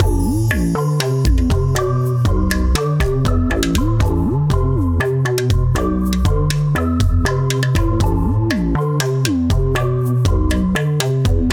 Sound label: cave Song MUSIC main; Castle on the Coast; PS4